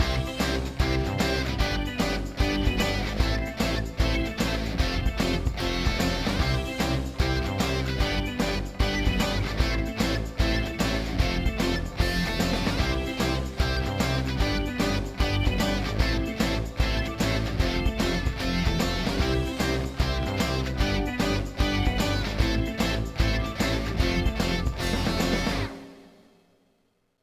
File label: Result Good Game Clear Loop; Glitch Busters Stuck on You